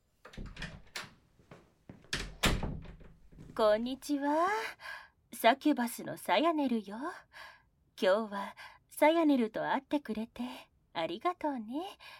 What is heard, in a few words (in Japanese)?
耳キスとフェラチオ, ASMR; 淫魔サヤネルの色仕掛け